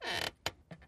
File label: flipped; kak priruchit drakona; vysizhivat yajtsa